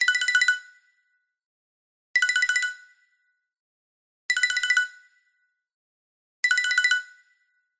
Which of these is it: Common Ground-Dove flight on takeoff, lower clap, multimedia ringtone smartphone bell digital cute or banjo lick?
multimedia ringtone smartphone bell digital cute